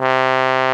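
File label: TRMBNE02, trombone, brass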